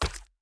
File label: Enemy Clicker Walk